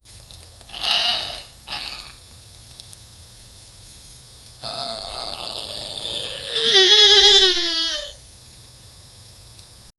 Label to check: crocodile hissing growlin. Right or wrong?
Right